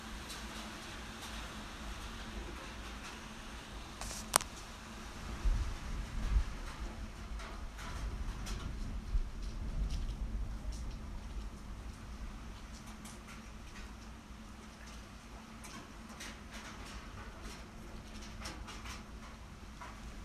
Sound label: Rain Outside Hotel Window Lake Ohrid